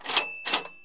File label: cash register